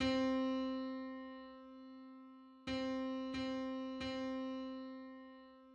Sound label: Breedsma on C